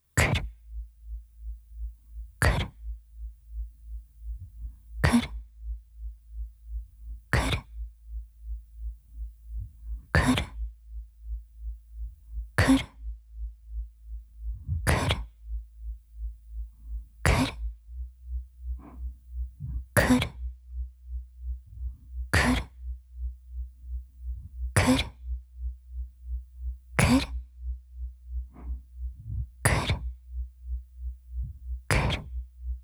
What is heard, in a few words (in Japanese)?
フリー乳首弄りループ音 くる…くる…